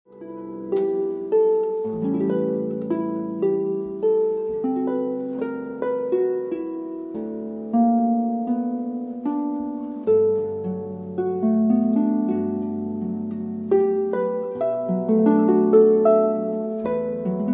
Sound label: Smooth Jazz Strings